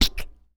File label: bounce